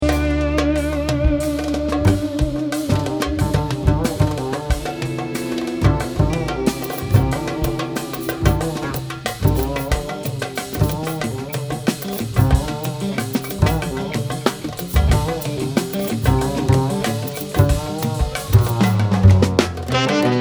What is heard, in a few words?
Bass Perc End